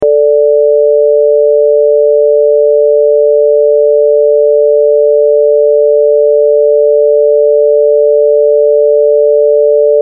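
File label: Pythagorean pure fourth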